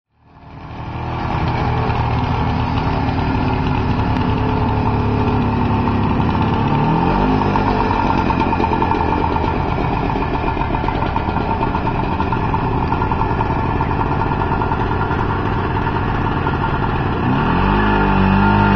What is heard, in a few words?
FMR Tg500